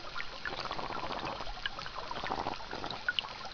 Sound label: groan type a